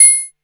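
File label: Axxe hi-hat open, ARP Axxe Drum Machines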